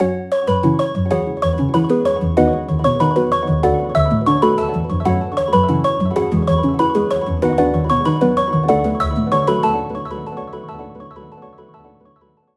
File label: Key, Sylenth1; Routed Sound; Sylenth1 Expansion - Story Walker Vol.1